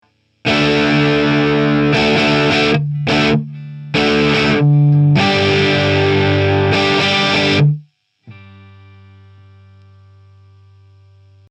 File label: TC8210 Plate